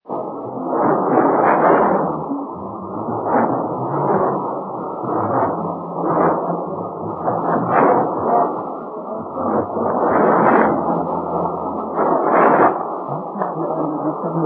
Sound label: Radio 5985 KHz; Congo